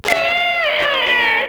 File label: Inked Humanoid Pain; Enemies; Warframe; Shadowgrapher